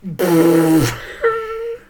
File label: Pfffrt